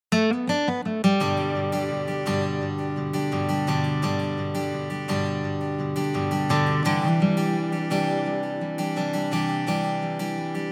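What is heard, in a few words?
6 string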